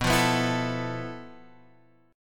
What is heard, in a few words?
F Major 7th Flat 5th B, guitar chord